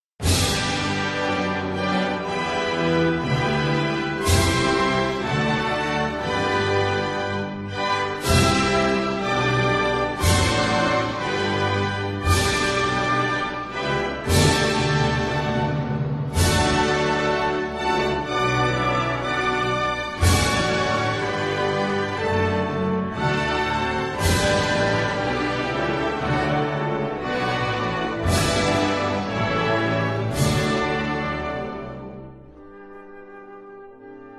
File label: anthem